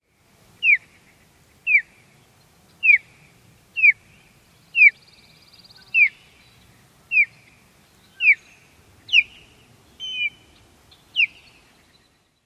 Osprey simple peeps

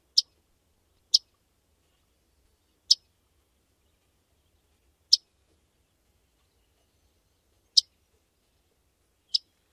Myrtle tchep calls

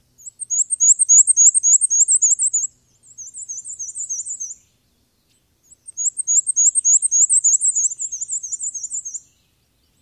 two males counter-singing

